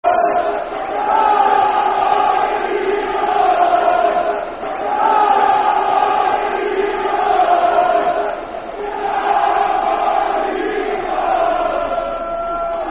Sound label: Football Chant (Soccer Song); Come On You Whites; Dunfermline Dafc